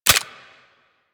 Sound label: Dry Fire Pistol; Weapons